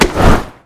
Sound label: tumble; LoL; vayne